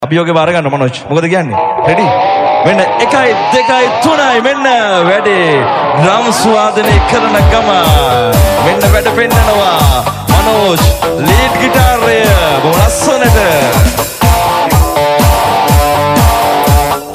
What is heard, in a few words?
Solo; Shaa FM; Sindu Kamare; Final Friday Attack Show